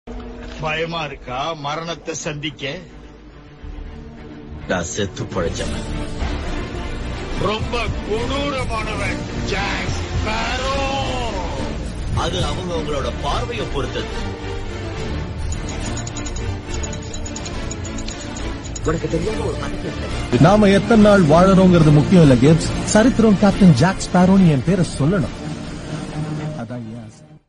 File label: bgm theme remix; captain jack sparrow